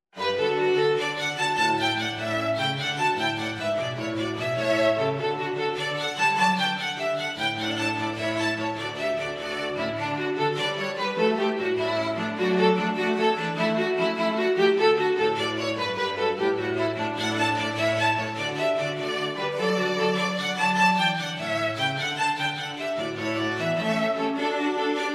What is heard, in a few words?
Jig